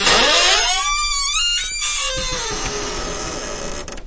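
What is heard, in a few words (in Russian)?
скрип резкий высокий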